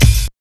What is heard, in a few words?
ROCK 132 BD, Big Beat Drumloops; AKAI